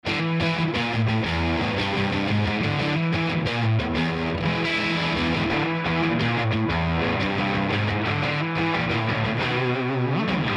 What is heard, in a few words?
API 550A, EQ; 吉他; Waves